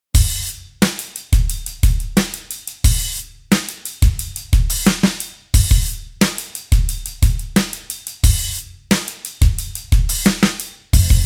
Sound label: (instrumental)